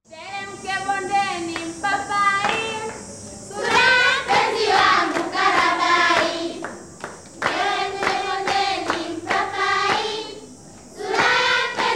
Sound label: afrikanskaya muzyka, tanzania children's dance; kongo nigeriya tanzaniya burundi; children s dance song ii